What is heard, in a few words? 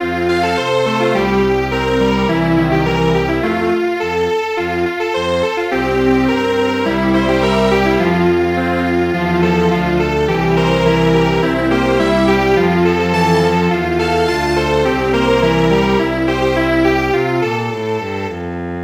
Samba Strings Quartet; midi; traditional